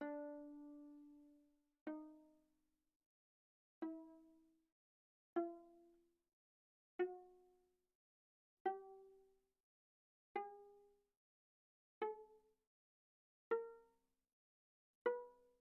Viola pizz sul D pp D4 B4

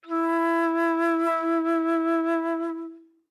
Alto Flute vib ff E4 stereo; Woodwinds

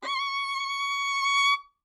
Violin arco ff sul A Db6; MIS Pitches - 2014; Strings

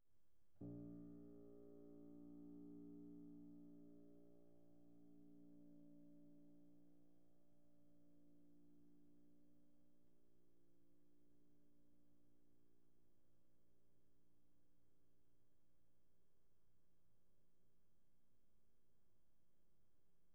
Piano pp Bb1

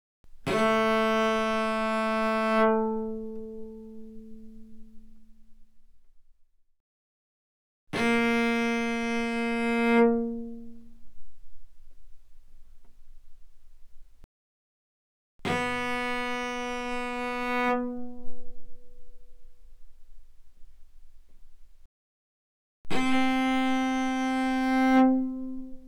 Cello arco ff sul A A3 Ab4; Strings

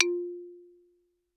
Xylophone rosewood ff F4 stereo; MIS Pitches - 2014; Percussion

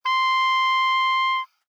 Oboe ff C6 stereo; Woodwinds

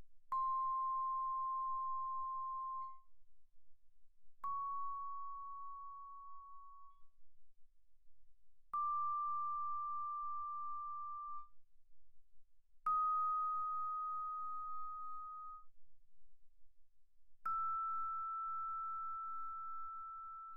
Vibraphone short sustain mf C6 F6; Percussion

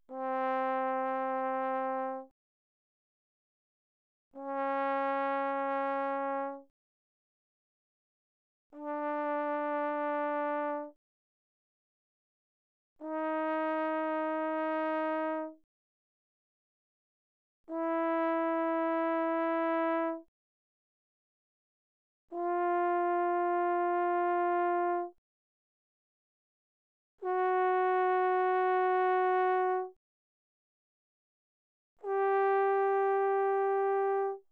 Bass Trombone, mf, C4 G4; Brass

